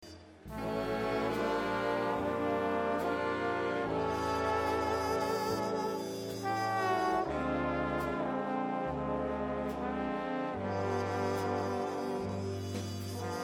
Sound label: big band